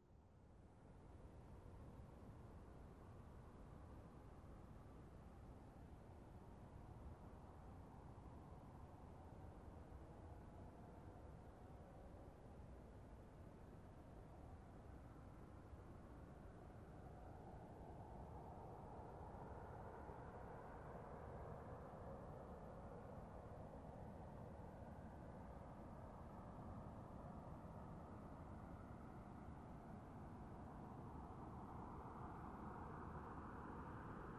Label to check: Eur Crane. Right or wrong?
Wrong